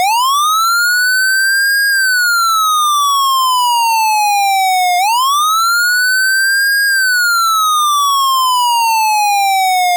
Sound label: Wail B, siren; whelen